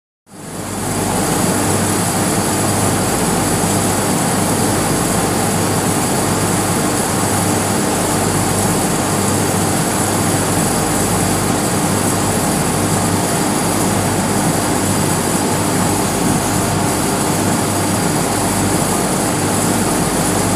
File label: Vcr Studio Atmos Very, air tones